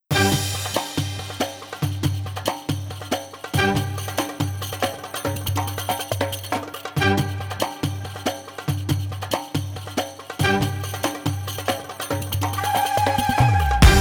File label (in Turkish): arabesk oryantel; by burak sound bank; arabesk oryantel vol 3